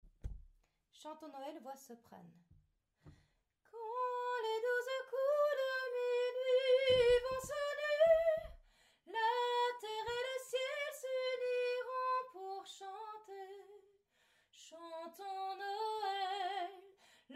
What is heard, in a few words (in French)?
Soprane; Chantons Noël